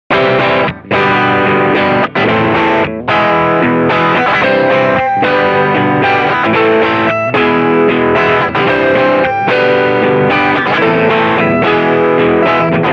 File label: brit combo; Micro Cube red